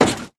plastic hit

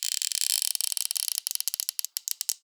wood creak

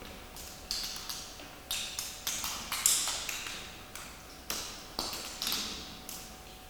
cave water drip

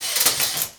window curtain pull

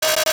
glitch

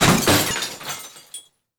cutlery drop

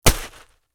solid hits dirt

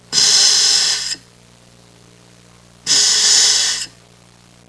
OXYGEN

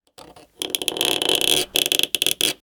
plastic scratch

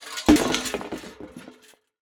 cooking pot

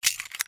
fast reload

